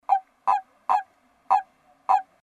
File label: Bufo bufo; BIOAC AMPH